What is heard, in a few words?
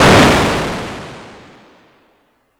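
explosion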